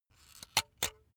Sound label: razor retract comb; soundpool